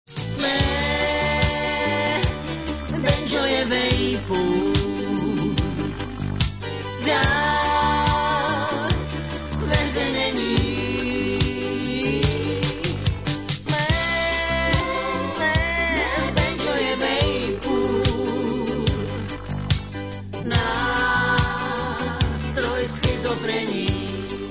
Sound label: banjo